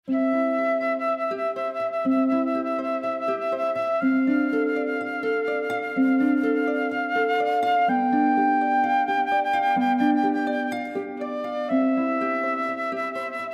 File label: ave maria harp flute